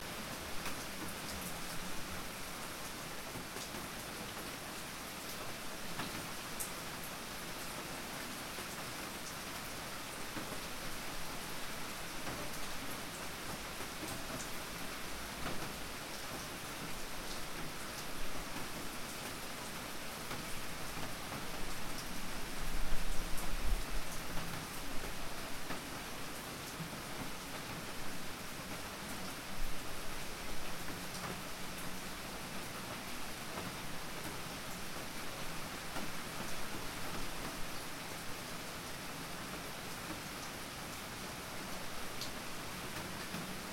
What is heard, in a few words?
rain